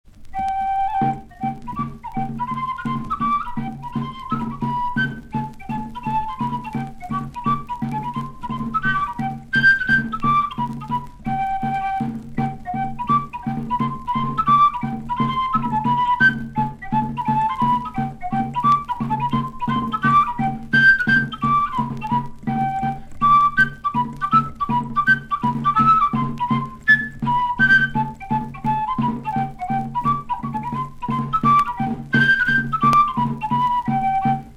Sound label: Bacca Pipes